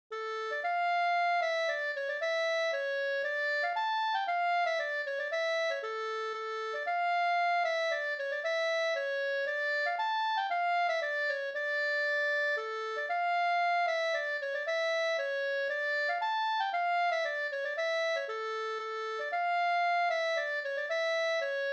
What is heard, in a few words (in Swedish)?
polska, Dmoll